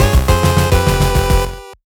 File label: Death; Bloodstained: Ritual of the Night